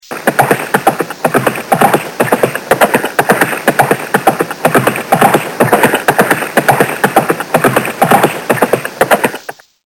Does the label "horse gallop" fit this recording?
Yes